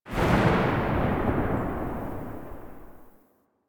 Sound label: Concrete Archgun Tail; Warframe; Archwing